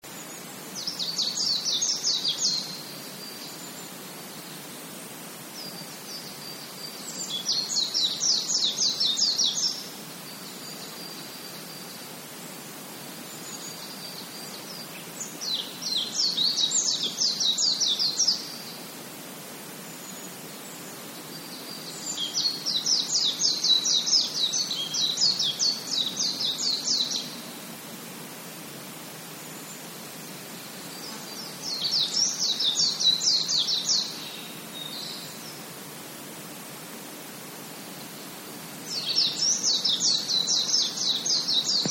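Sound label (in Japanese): mejiro, Japanese white-eye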